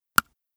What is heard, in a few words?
scale